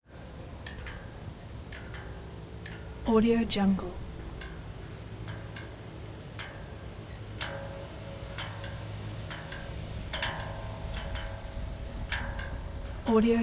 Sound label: flag pole halyards